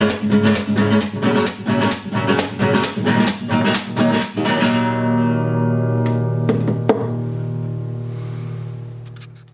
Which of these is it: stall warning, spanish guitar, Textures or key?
spanish guitar